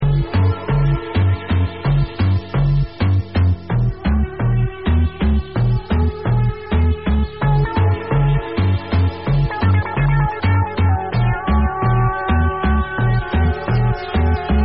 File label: break